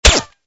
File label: hit; cstrike; runman